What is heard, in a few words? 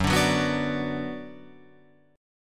Gb Suspended 4th Sharp 5th, Guitar, Open F; strummed